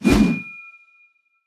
swing; smite; god sobek

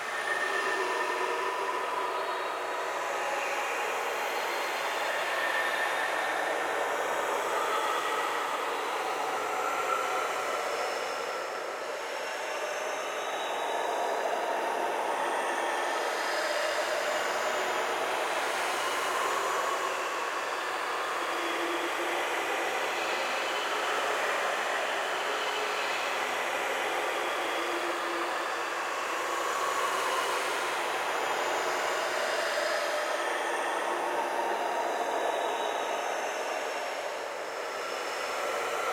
ambience bg magic neutral lp 2ch; smite

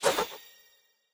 Banana Throw; smite; raijin

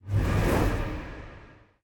body swish; smite; thanatos

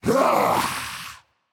battle cry; smite; voicepack; odin

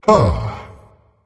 sigh; Smite; cerberus

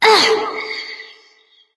grunt lift light; smite; voicepack; hel

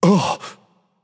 ugh; smite; tsukuyomi

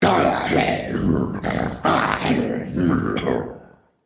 grumble; smite; kukulkan

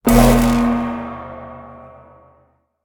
land; smite; raijin

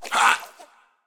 hitreact small

